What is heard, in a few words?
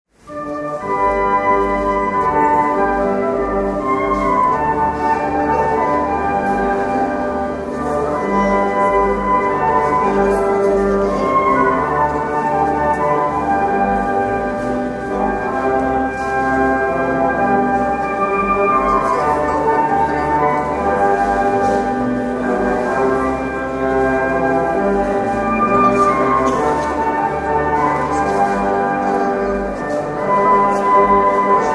Siyahamba Instrumentaal; Wereldfeest